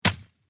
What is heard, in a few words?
tree hit; hits